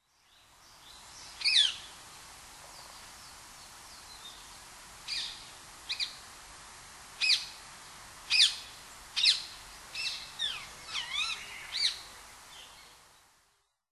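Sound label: Rose-ringed Parakeet; honsei; kunitachi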